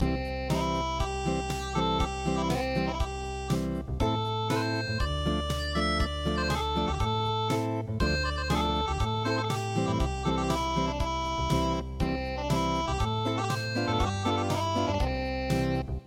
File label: sea gull sailor unpluged